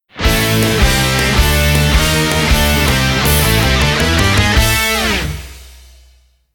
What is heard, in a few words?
VICTORY JUBILEE; Shin Sangokumusou; kyukyoku ongen